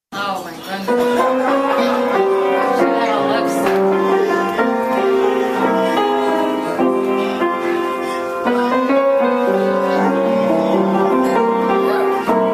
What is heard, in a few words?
tinkering on piano